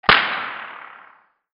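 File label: percussion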